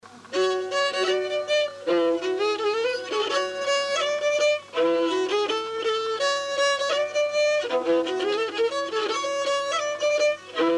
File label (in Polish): polka bistra